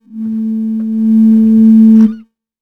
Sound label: Amb Foghorn Distant; Bruce Lee Quest Of The Dragon; XBOX